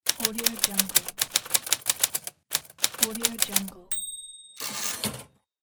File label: mechanical typewriter